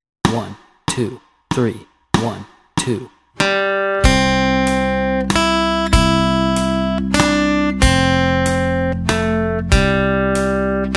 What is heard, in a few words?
Amazing Grace Melody Track, guitar